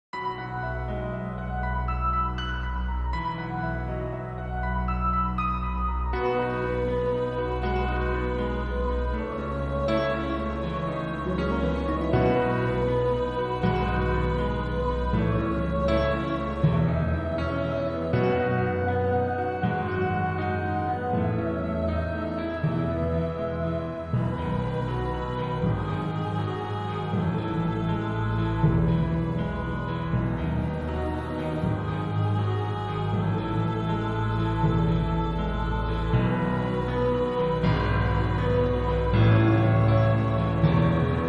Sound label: Ballad (Instrumental)